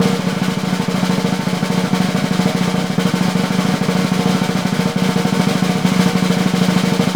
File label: SNR, Synclavier Percussion 2 - World & Orchestral